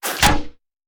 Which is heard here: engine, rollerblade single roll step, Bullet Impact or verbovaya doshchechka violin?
Bullet Impact